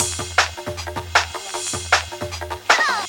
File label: Box Break, Loops; m8 breaks